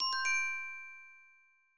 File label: favourites timeline updated; TWBlue